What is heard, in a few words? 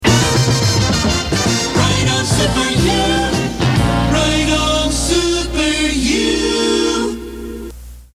Jingle; WYOO; RO Super U